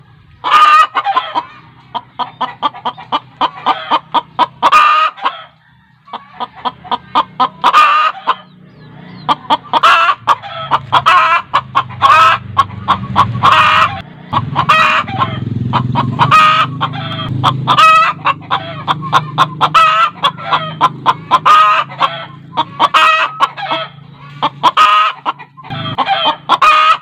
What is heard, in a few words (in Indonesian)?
suara ayam betina